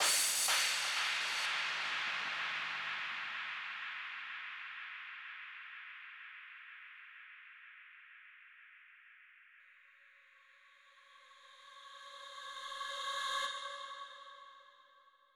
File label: 125 G FX